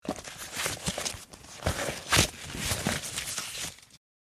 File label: Gas mask wearing; military equipment sound effects